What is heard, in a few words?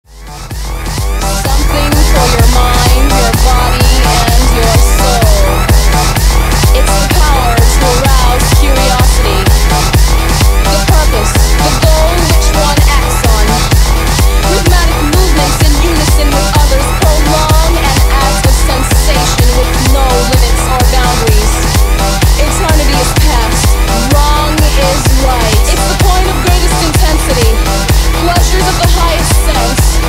tamasik music techno